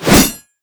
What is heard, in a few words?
Tech Attack